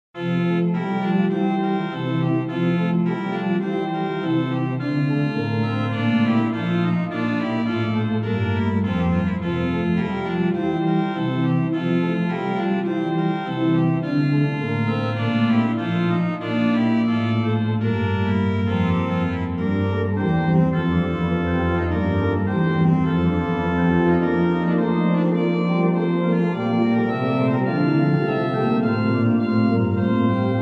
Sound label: Noel, Organ Music, Souvigny; Boely